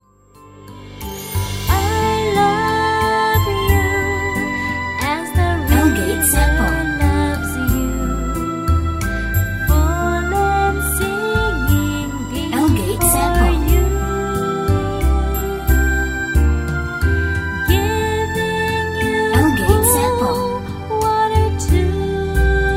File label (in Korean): 영어여 Song Woman